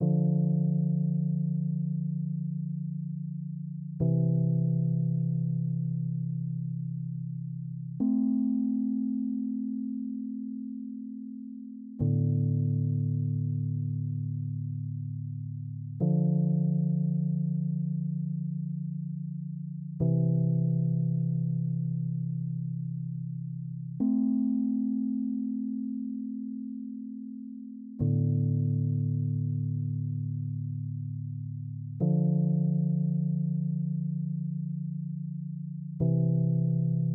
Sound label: D120 Dystopiano dry